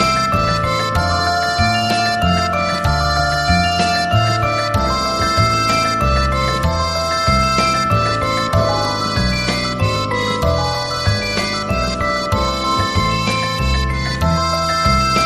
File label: Santur